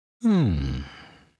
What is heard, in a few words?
red Panda Looks Up; netent